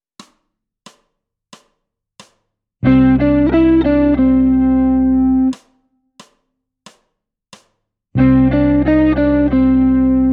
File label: blues